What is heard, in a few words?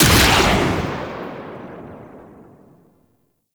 Arch Railgun Charged 3D Fire; Warframe; Archwing